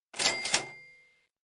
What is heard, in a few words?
claim reward